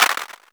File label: Maracas